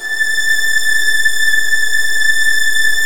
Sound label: A4, Virtuoso Strings; ILIO; Kirk Hunter Virtuoso Strings VOL-1